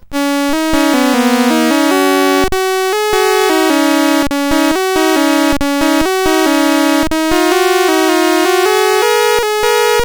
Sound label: World Cup, CSG8580R5